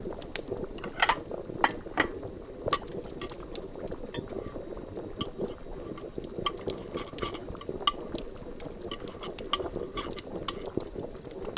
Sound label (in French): Assiettes couverts bouillon cheminée